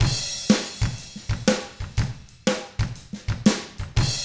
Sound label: SHUFFLER, Drums; ILIO; Double Platinum Drums 1; 122 SHUFFLER